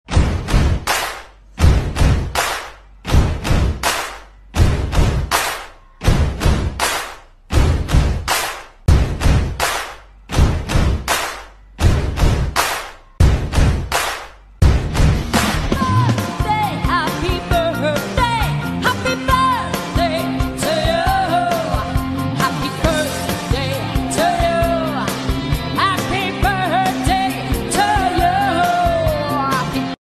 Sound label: music happy birthday tik tok